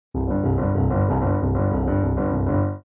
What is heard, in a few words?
Old vintage cartoon running